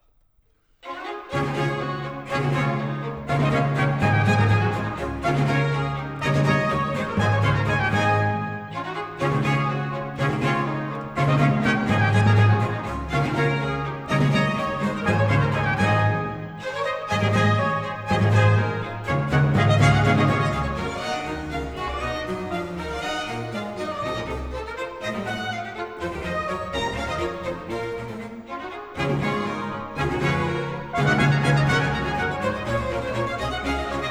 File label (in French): Ouverture n°3 en ré majeur, Bourrée; Bach; BWV 1068